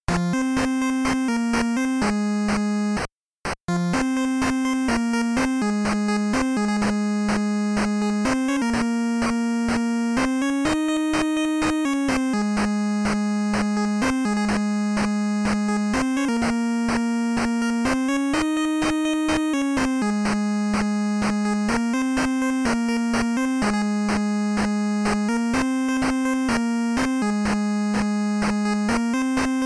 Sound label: 8-bit remix; AVGN